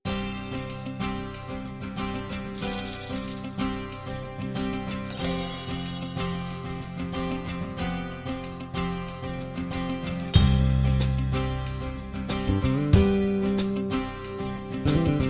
strummin pop

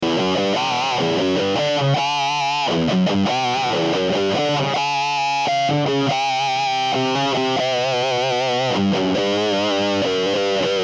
drive; Distortion; Bridge HB

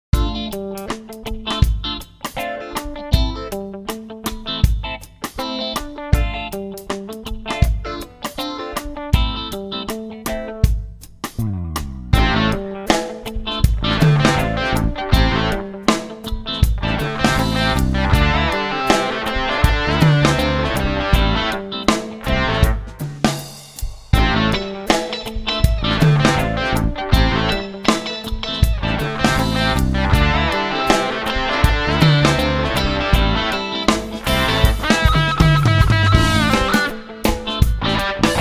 rocktron g200; Rocktron Utopia G200

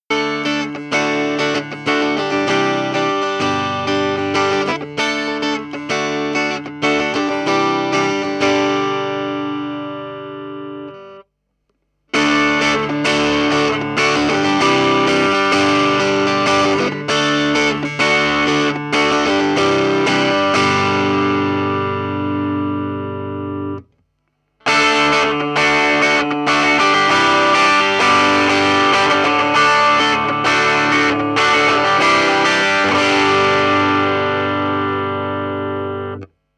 clean; Engl Gig Master 15

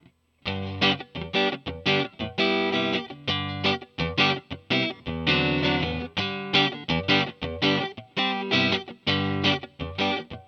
bulldog clean

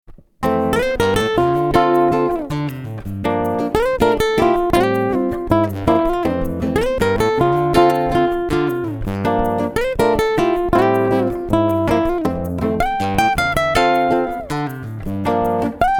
jazz, Yamaha SLG 130NW

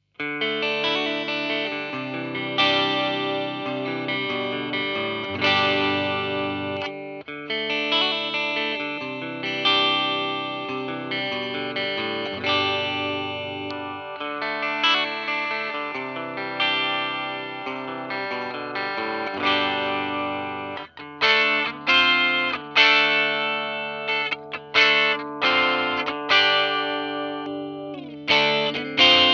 clean

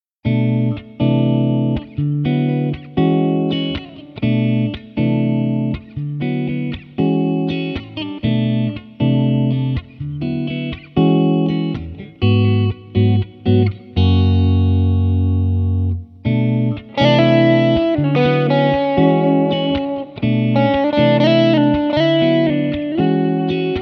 tr-1 jazz; greg bennett

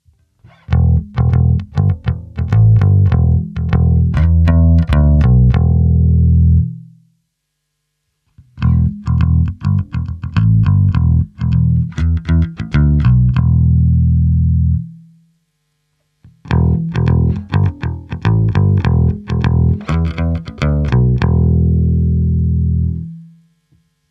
pick; Cort

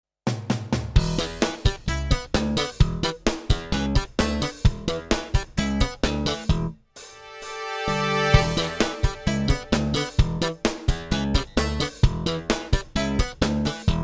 behringer x2222usb song

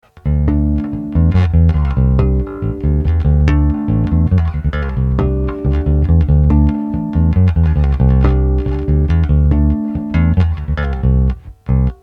finger, ESP Grass Roots G-JB-47R-CAR; Jazz Bass

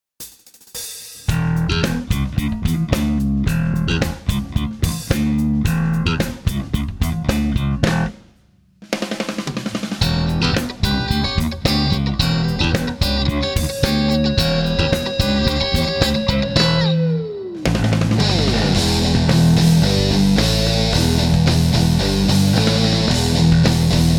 aria ab-20

